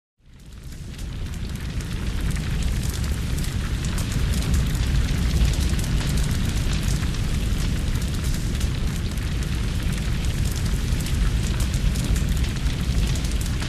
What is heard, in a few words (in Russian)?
zvuki lesnogo pozhara gul shum zvuki plameni zvuki goryashih derevev tresk